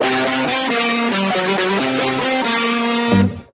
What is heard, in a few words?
guitar holly hobby; Loops; Phonky